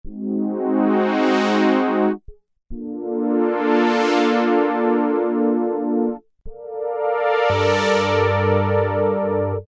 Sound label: Crescendo Brass, Korg Poly-800